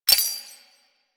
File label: Step Spur; Enemies; Foley; Footsteps; Warframe; Duviri; Dax